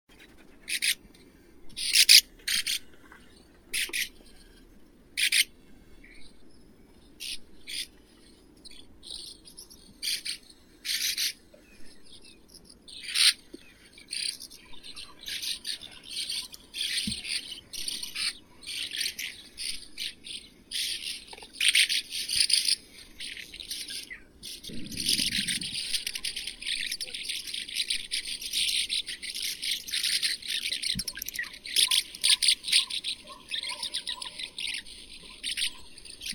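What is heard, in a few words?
pale martin, Riparia diluta; lastochka blednaya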